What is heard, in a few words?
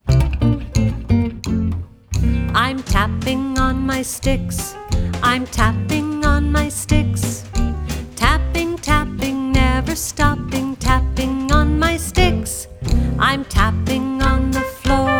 Tapping on My Sticks